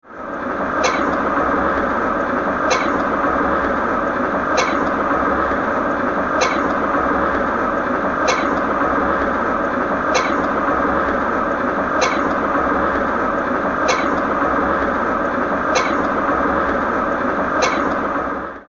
Cough

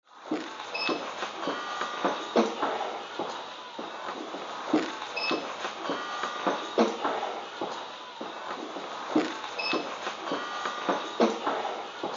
guitar

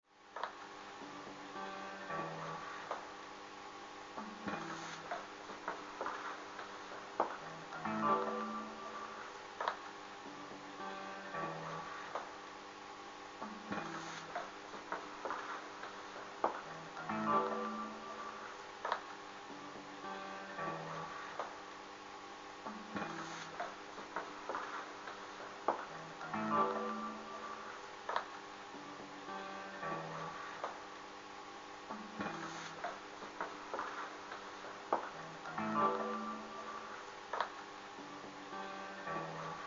Guitar

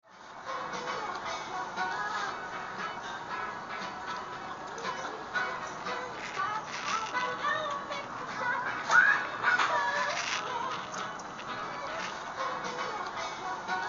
Singing

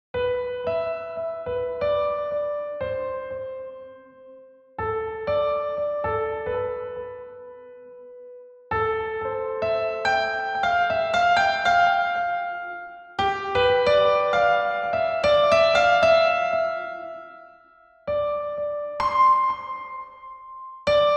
Music Box

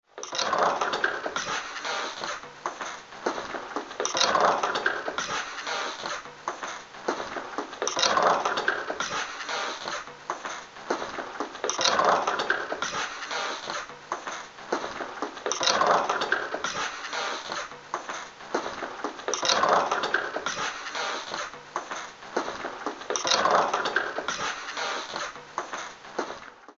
Drums

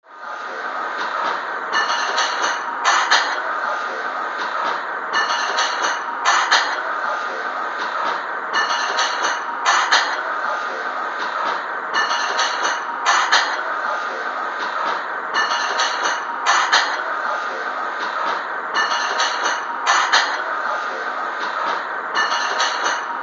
Drumming

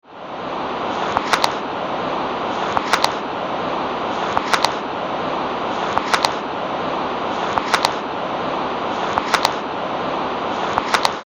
Windy

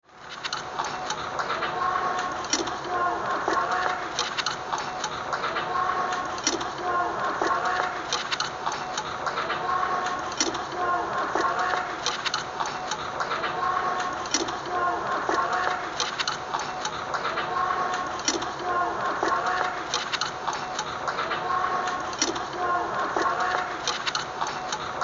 Coin juke box

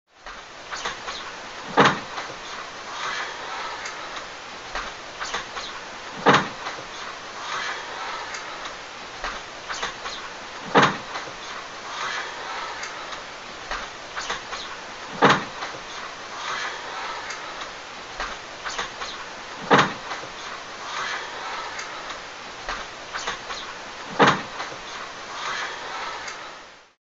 Dove

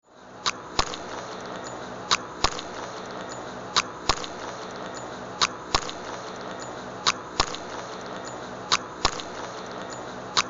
Hole punch

